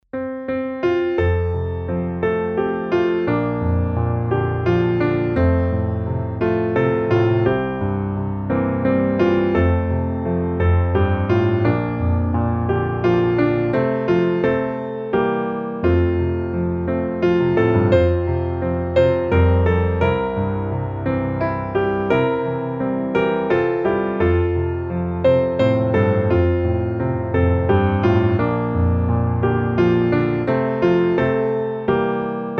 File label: piano